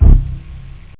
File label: X FADE BASS; Amiga 8-bit Sampled Voice; 8,363 sample rate